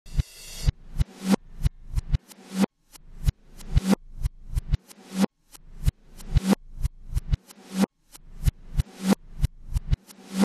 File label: Reverse Alien Drum